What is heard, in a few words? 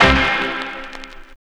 HIT, 160 BPM, Drum & Bass; Ueberschall; Drum & Bass Flash Trackz